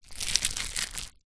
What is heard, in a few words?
office adhesive tape loose scrunch up; zapsplat